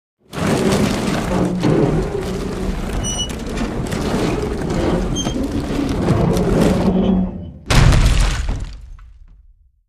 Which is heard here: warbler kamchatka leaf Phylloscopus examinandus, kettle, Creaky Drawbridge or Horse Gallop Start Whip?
Creaky Drawbridge